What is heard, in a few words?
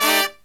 HI CHORD, Brass; USB Soundscan vol.29 - Killer Brass Riffs; AKAI; HIGH CHDS